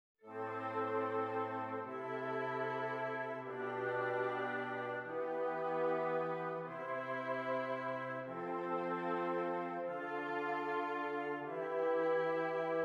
Zwei simmiger Contrapunkt; Ronge

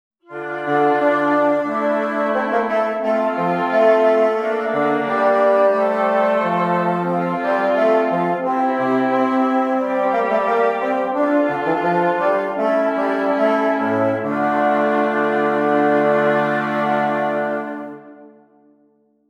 Stimmiger Contrapunkt; 5 Gattung; Ronge

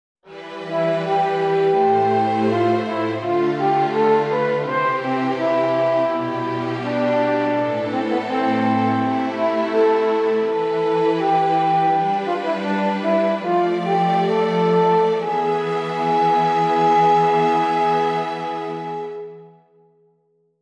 Stimmiger Contrapunkt; 5 Gattung; Ronge; corretto

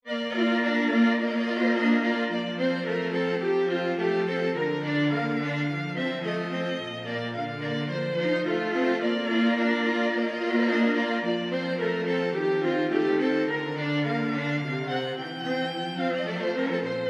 Kleine instrumentalmelodie B Dur; Armonizzazione Graziano Denini; versione per archi

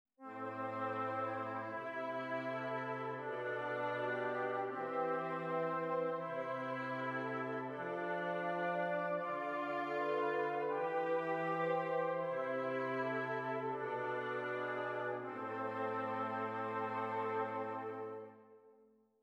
Stimmiger Contrapunkt; Ronge

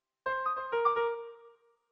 Kadenz; Unbekannte Kadenz zum ersten Fassung des B Dur Konzertes

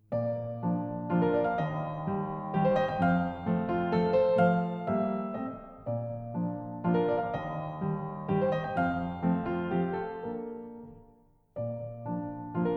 Walzer für Klavier, Andante con grazia; Gertruds Traumer Walzer

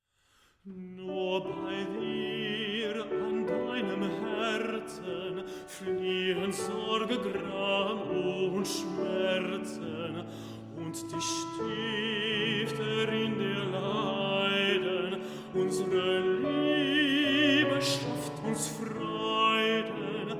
Lied fur ein singstimme mit Begleitung von Klavier; WoO 115 An Minna; Textdichter unbekannt; Allegretto

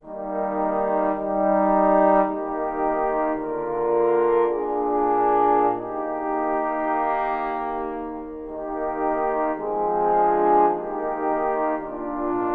Equale fur vier Posaunen Es Dur

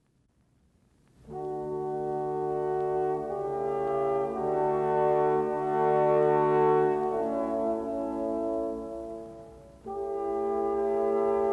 Equale für vier Posaunen B Dur Poco sostenuto; Drei Equale

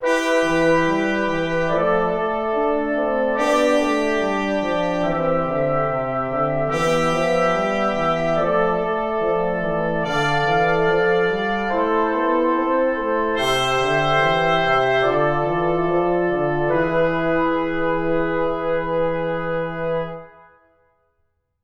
Stimmiger Contrapunkt; Ronge

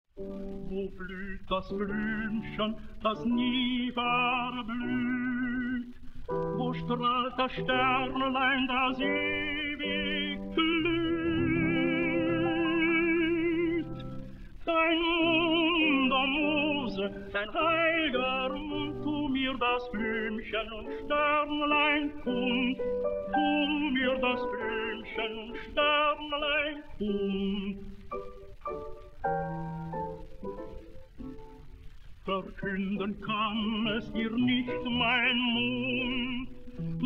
Lied fur ein singstimme; Das Geheimnis; Gedicht von Ignaz H. C. von Wessenberg

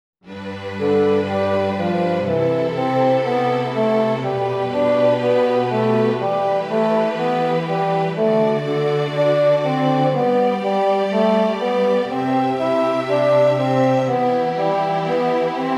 Stimmiger Contrapunkt; Ronge

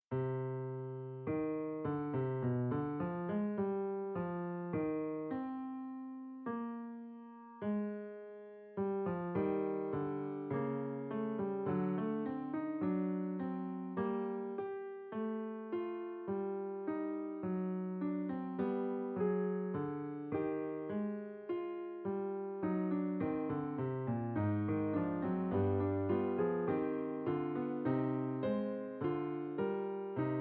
Lento fur Pianoforte oder Orgel; Kuhmstedt F.

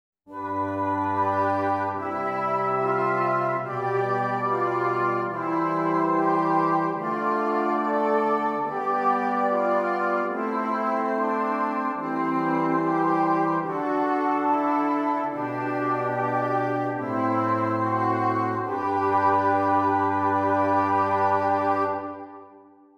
Stimmiger Contrapunkt; Ronge; corretto

